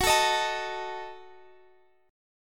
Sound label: Gb Minor 6th; Gbm6; strummed; x,21,19,20,22,x